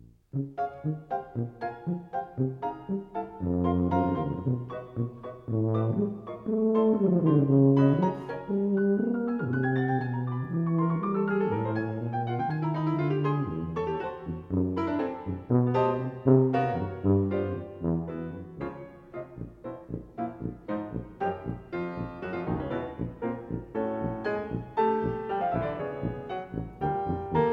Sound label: Sonata for Bass Tuba and Piano, Allegro assai; HINDEMITH